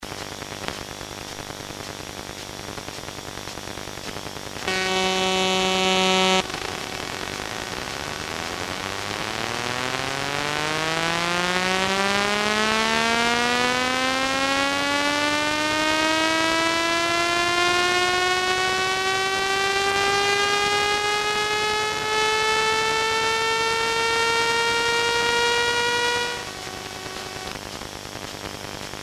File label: subway departure inside